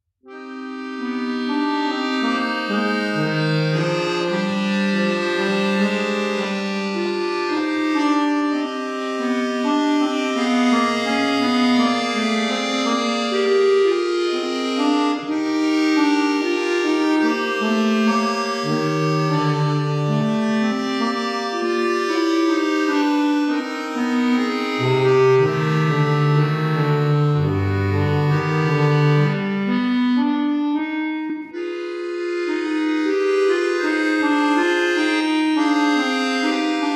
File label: Prelude in C minor; Lyadov